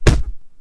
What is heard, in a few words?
Hit Unit; Civ4 warlords